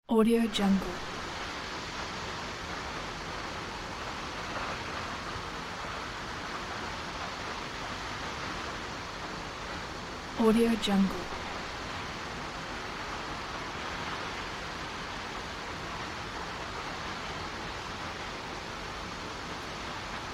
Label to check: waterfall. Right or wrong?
Right